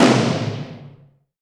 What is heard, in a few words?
TOM, Dance